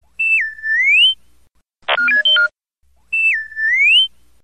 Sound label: Whistles; R2